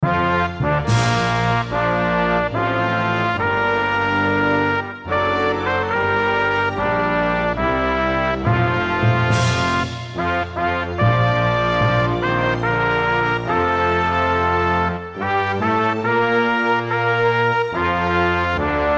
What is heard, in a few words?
National Anthem, National Anthem United States Orchestra; Orchestral; Blastwave